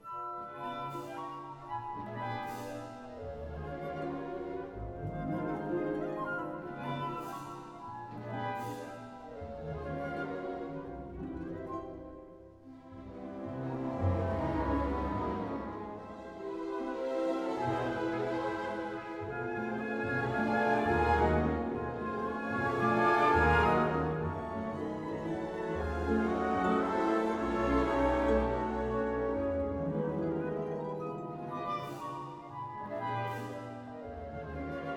Valses nobles et sentimentales, Version for Orchestra; IV. No. 4, Assez animé; John Wilson; Ravel Orchestral Works